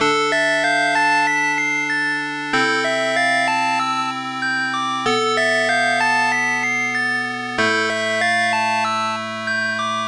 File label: 8bit; Yume Nikki